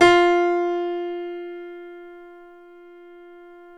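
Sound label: F3 piano; Northstar - New Gold VOL-2; BOS.GRAND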